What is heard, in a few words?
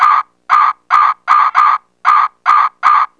dog